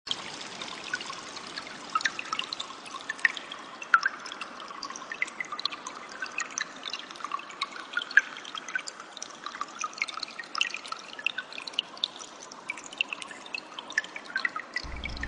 Suara Cililin Ngerol Panjang

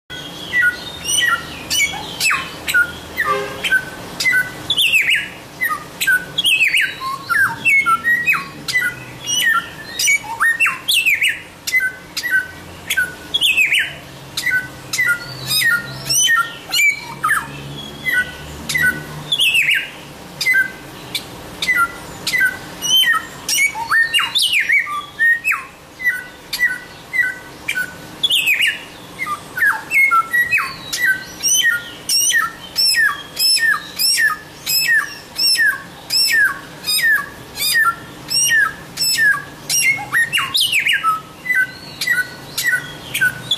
Suara Burung Samyong Gacor

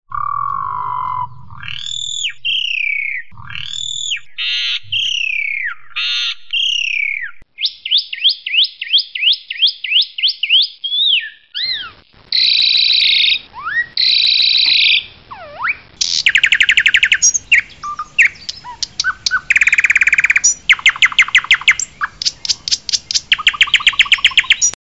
Suara Burung Jalak Suren Gacor; Full Isian jalak suren masteran

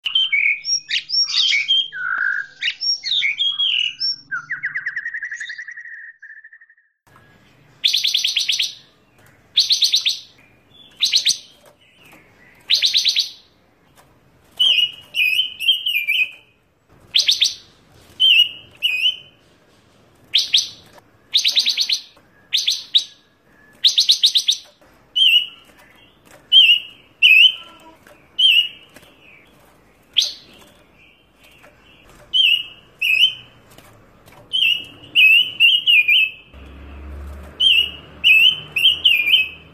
Suara Burung Pancawarna

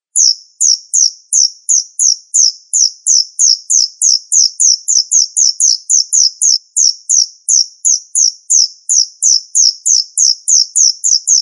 Suara Jangkrik

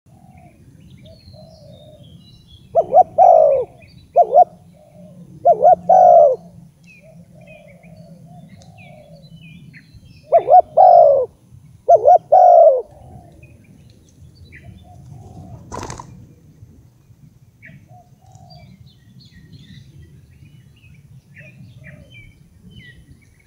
Suara Burung Derkuku dan Tekukur

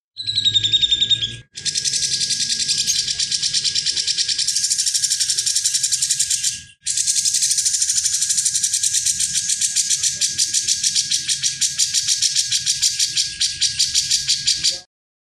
suara cucak jenggot isian

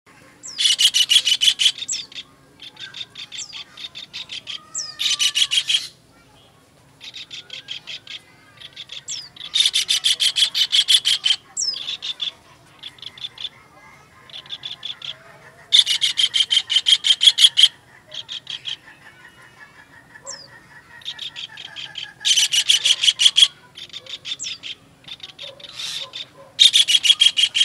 Suara Burung Tengkek Udang

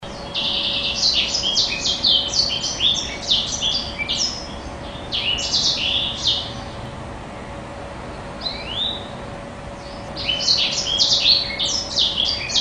Suara Burung Blackthroat Gacor untuk Masteran

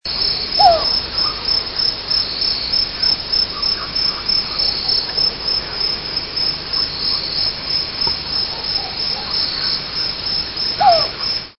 Suara Burung Hantu

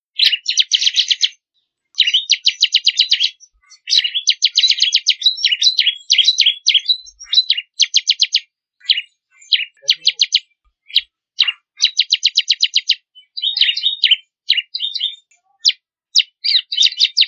Suara Ciblek Kristal Ngebren Gacor

